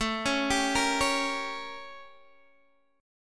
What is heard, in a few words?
A 7th Flat 9th Guitar DADGAD; x,12,11,12,13,11